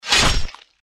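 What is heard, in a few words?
deathclaw claw attack; npc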